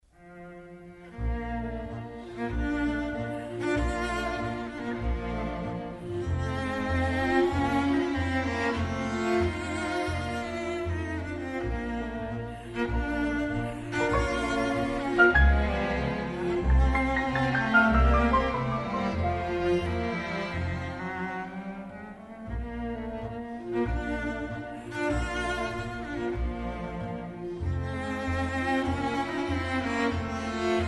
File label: piano quintet a major; schubert